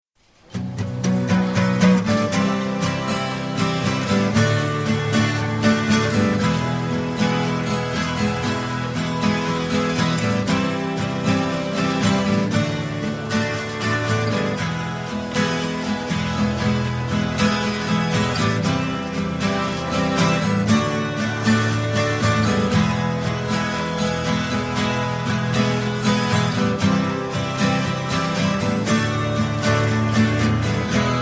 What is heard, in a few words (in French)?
musique chanson instru